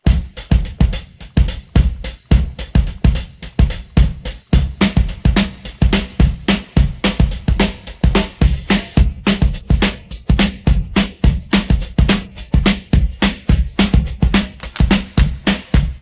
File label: Drums